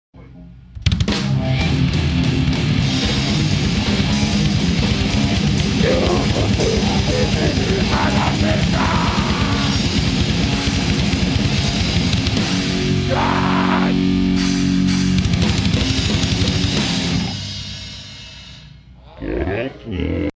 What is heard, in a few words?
grind live